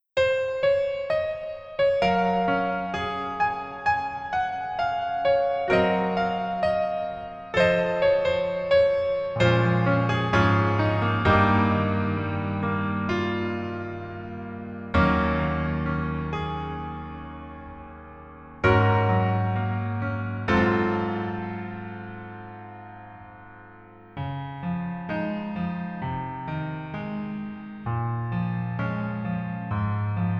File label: Piano ver